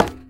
metal step; Golden Crayon Sounds

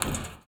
roof step; Golden Crayon Sounds

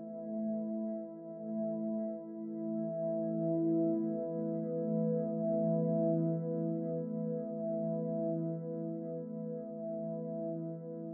Interaction Background

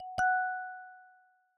Remote Attention Request Alert; iOS Sounds

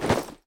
jump; Golden Crayon Sounds

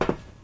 metal step; Golden Crayon Sounds

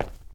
concrete step; Golden Crayon Sounds

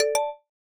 Keyboard Up; quinton's new sound scheme

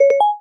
ambiguous; jbl; iOS 26

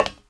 metal step; Golden Crayon Sounds

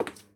ceramic step; Golden Crayon Sounds

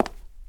cement step; Golden Crayon Sounds

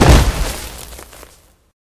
cave grounds fall; Golden Crayon Sounds

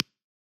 mouse click; iOS Sounds

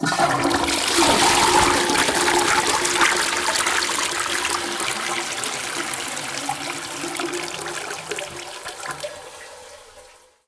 toilet flush; Golden Crayon Sounds

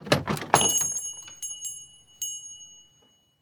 store open; Original TK Sounds

